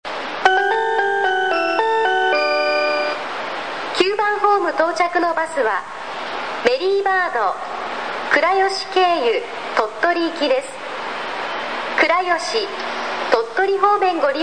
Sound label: bus center; ９番ホーム案内放送; 広島バスセンター; 広島そごう３階にあるバスターミナルで、高速バスだけではなく一般路線バスも発着します。; 出発ホームでは主に高速バス到着時に自動放送による案内放送が流れ、冒頭にはのりば毎に異なるメロディーがあります。